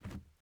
plastic jump

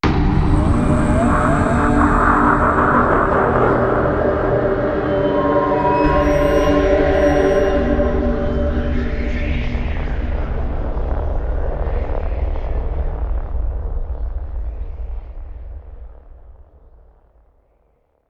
Round Start; Buried; Ghost

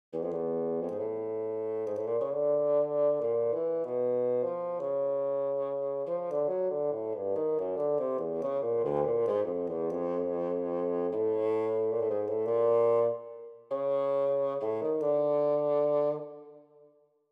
Bassoon Melody 94bpm pickup; Skylar & Plux: Adventure on Clover Island; PS4, Windows, Xbox One

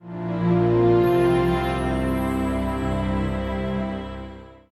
Jingle Positive Short; luka und der verborgene schatz windows gamerip 2008

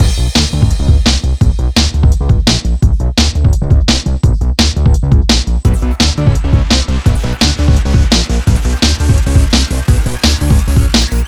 game music fast

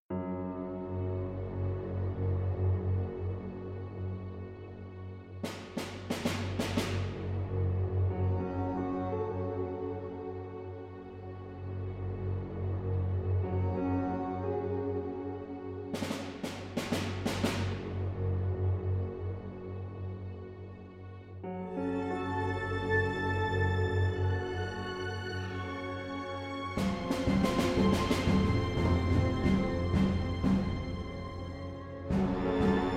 Menu Music; Granny Chapter Two; Granny2

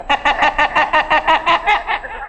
jungle; The Wild Thornberrys Movie

